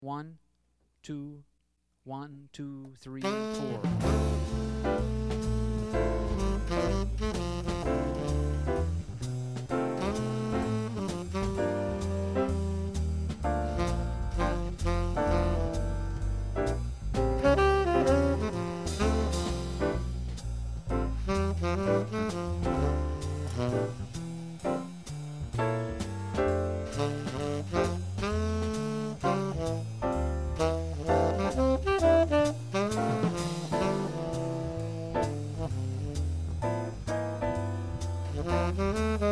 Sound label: subtone, sax